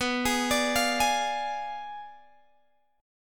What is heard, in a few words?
B Diminished 7th B down Guitar Open G